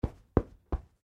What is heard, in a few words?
Couch Cushion Fluff, Couch Cushion Fluff Furniture; Living Room; Household; Blastwave